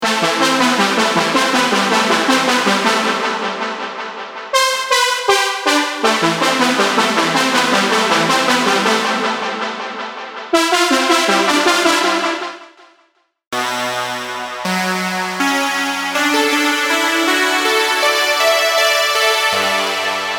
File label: Melodies Synthwave Synth Pop